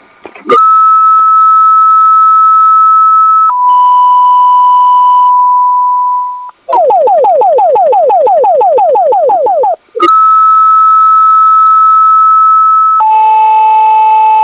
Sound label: Pager Tone